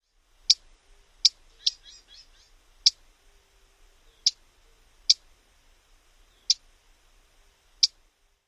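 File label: Black-throated Gray Warbler calls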